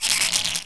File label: Scorpion Attack